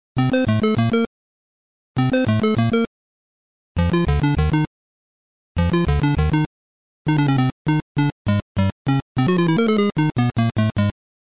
underground

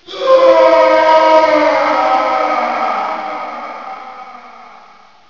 fear scream

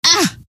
pain, scientist female

